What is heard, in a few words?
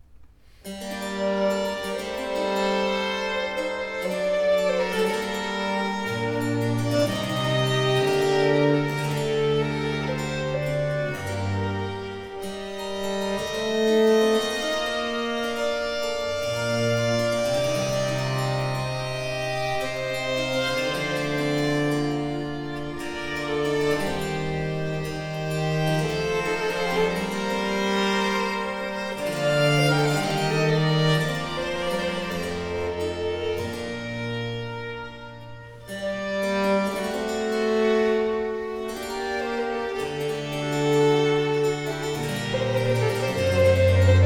Sonate 1 in C major - 3. Adagio; Arcangelo Corelli; Corelli - Complete Works; Klassika